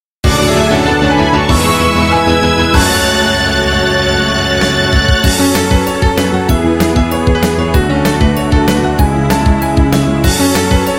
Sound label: Jingle